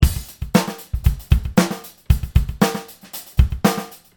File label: Delay drum